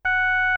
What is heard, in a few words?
beep